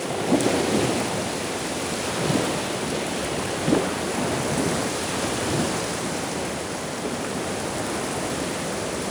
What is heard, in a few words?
ocean rain; Soldier Of Fortune II Double Helix; XBOX; ambience